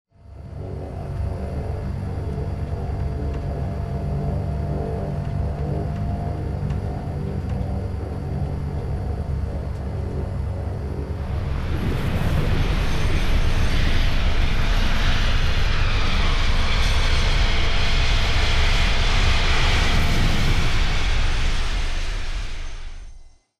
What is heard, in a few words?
dropship interior; Titanfall 2